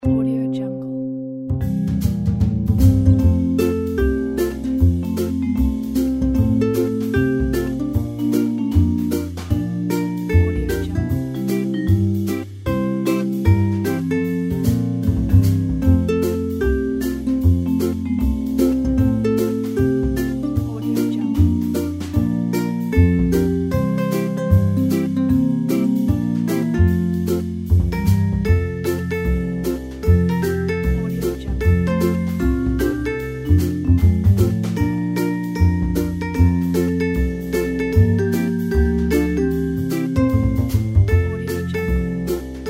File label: jingle bells chill jazz